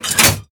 Windmill Lever Lock; moul-assets

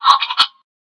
Monkey Speaker call; moul

